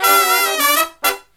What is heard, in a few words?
Brass Riffs; USB Soundscan vol.29; AKAI